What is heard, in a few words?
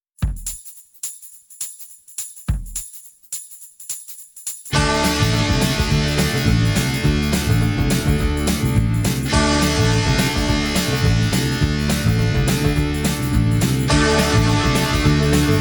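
d major with fade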